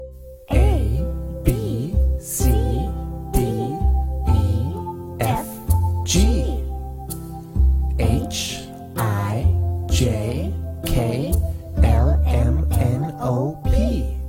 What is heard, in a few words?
abc song